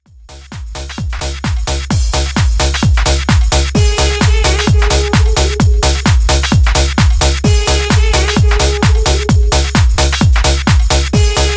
Clean Intro 130Bpm Clean

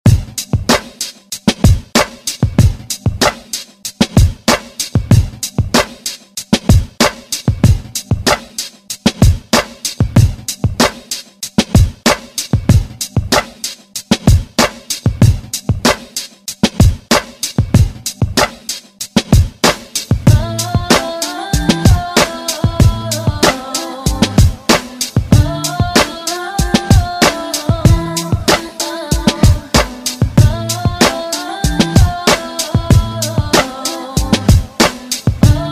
Top40 Redrum

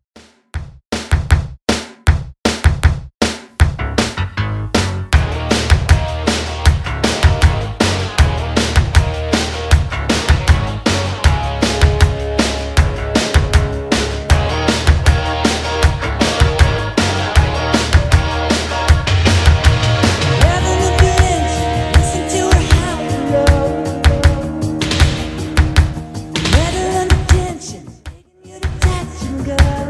Mixshow Redrum 157bpm; Top Gun

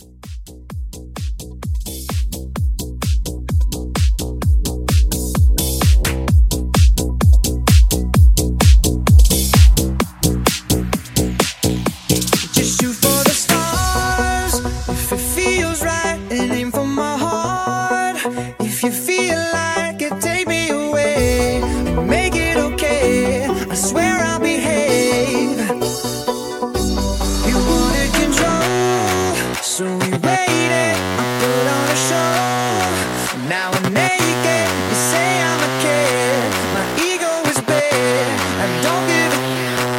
Edm Mashup 129

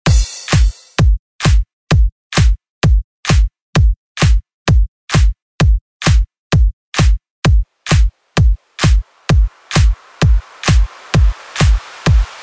Pop Redrum; Deejay Hit

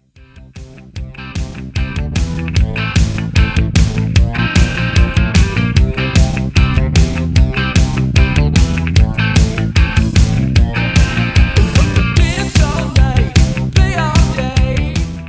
Rock 150bpm; Rock Re-Drum

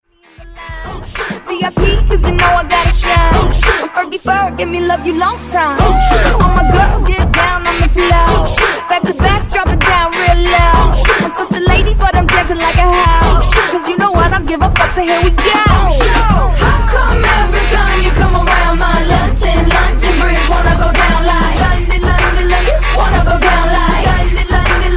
Funk 97Bpm

